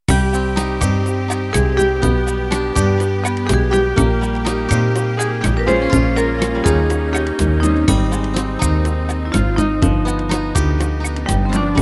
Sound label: Latin Unplugged Intro